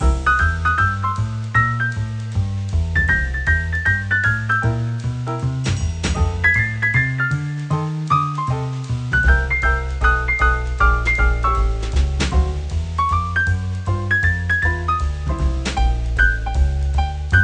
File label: sound track loop mono